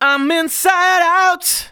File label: vocals sung F#; Techno Trance Essentials